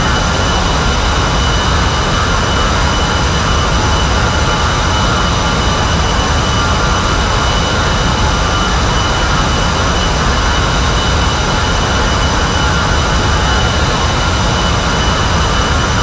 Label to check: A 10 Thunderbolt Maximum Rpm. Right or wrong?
Right